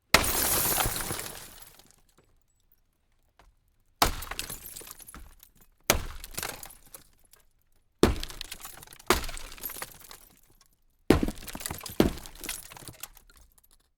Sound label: smashing car sunroof series